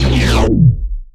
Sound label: Menu Scifi Shutdown; Menu FX